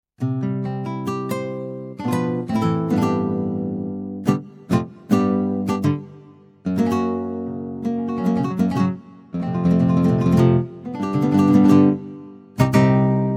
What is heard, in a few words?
Nylon Guitar, KORG